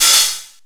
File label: Bass Open Hat, Jomox Airbase99